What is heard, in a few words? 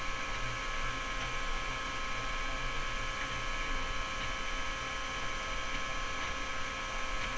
recirc fans; prosim